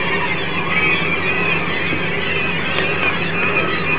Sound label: marble island birds